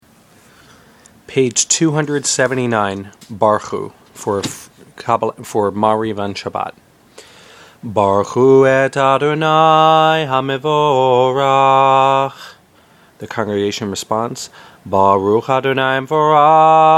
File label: barchu, shabbat tunes; shabbat ma'ariv